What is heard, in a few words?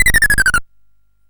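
Nebulus, MOS 8580 SID chip music, games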